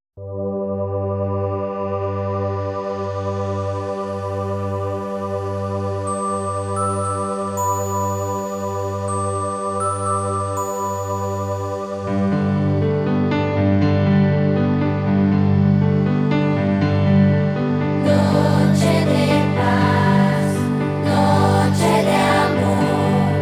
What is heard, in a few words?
children choir